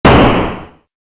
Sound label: rocket explode